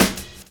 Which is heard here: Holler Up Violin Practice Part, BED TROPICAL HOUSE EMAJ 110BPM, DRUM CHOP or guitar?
DRUM CHOP